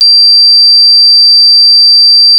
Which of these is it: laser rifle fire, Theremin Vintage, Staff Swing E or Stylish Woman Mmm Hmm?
Theremin Vintage